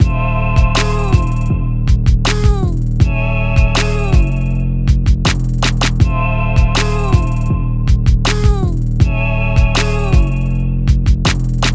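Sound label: heavy trap 80.00 BPM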